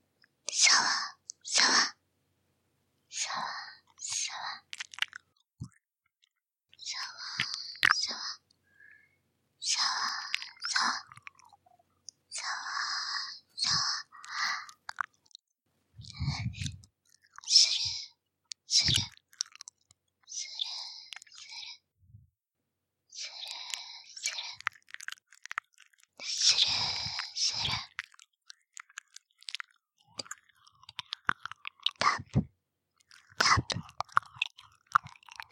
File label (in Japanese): 耳舐め＆オノマトペ